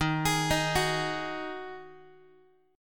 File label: D sharp Minor Double Flat 5th, Guitar; arpeggiated; chord; x,11,11,13,10,x